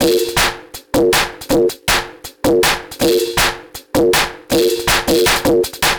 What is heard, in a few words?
DRUMLOOP D+B 160, Drum+Bass Loop; eJay